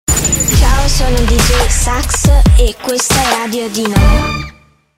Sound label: SAX